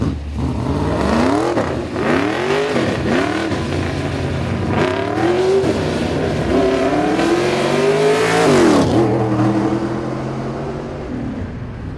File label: 351C racecar